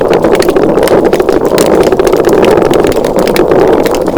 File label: sea trout chorus